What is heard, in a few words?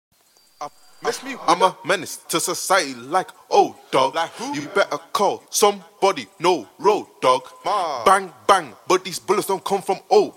UK Trap Acapella 146 Am